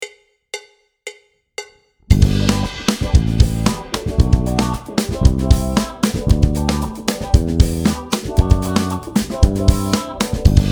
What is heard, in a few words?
Gmaj at the 3rd Fret; Yamaha